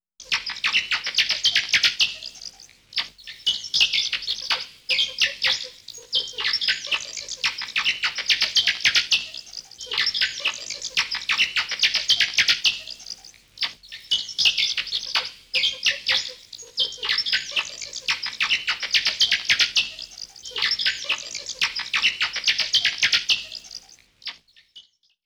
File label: Giant Bats Chittering